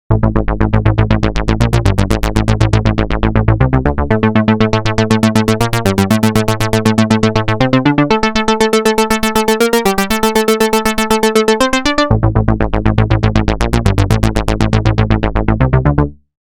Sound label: synth